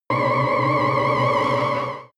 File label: extrinsic siren, energie